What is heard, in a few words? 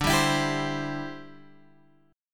D 9th Suspended 4th, chord; D9sus4 Chord {10 10 X 9 8 8} strummed